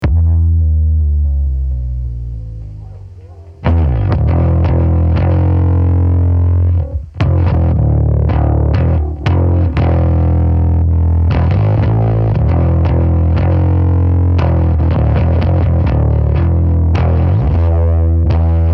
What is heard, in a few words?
tubetech bass